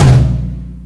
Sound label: CHEER